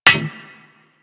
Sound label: Melee Staff Impact Generic; Warframe; Weapons; Staffs